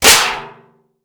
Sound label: Metal Strike; Crashes; 1001 Sound Effects